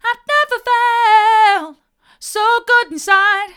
hard, vocal

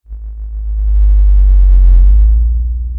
pop, Sound of a cruise ship horn

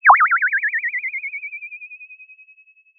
Sound of a bird tweeting nicely

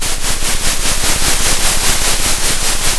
center, Sound of a train

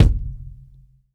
Kick, drum

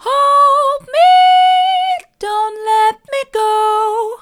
hold me dont let me go soft, vocal

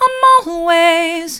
i'm allways mid, vocal

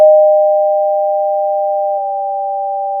pop, Sound of a school bell

